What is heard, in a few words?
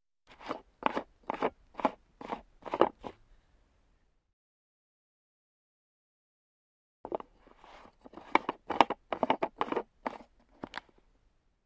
Motorcycle gas cap